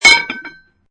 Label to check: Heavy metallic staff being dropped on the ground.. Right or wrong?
Right